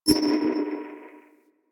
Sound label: Warp Waypoint Pulse; Warframe; Quests; Chimera Prologue